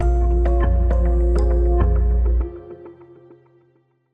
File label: Bumper